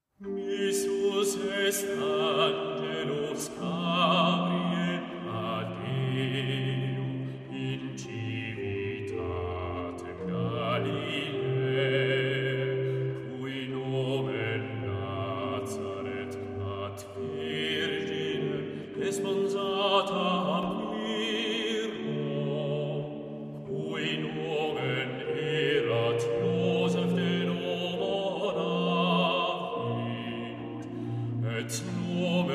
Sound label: Missus est angelus